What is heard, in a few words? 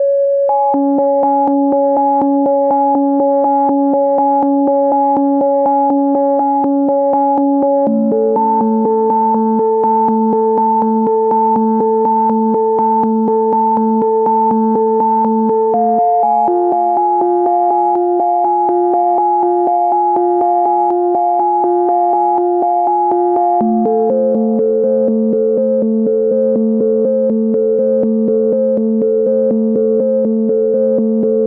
122 BPM Melodic Arps D maj B min Tonic G; MMB